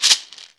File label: subtle reverb shaker one shot d key, percussion